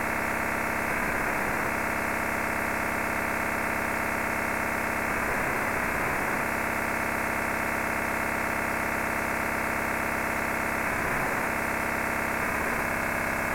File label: DMR MOTOTRBO Sample, DSD decoder